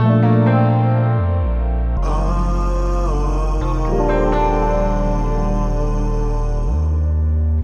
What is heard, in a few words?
keys aye ya ooh, Phonky loops